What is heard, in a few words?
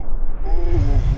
Punch Left Speed Up; Thug; Pitch Black - A Detective's Demise